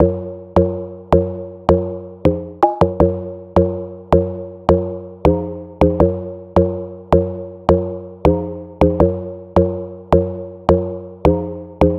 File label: Funny Melody Loop BPM 160 D Min; Bihu; waves of bihu vol 2; Gully Loops